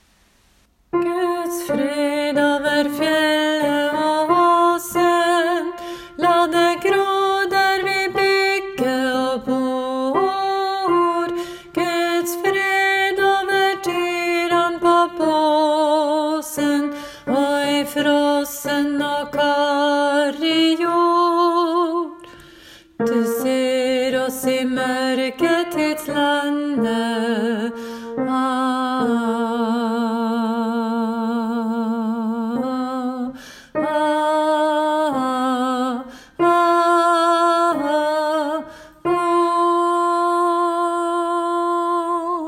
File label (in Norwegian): nordnorsk korpsversjon alt vers 3; åfjord